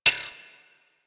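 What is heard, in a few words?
machine gun mech; Weapons; Archwing Mach Gun B; Warframe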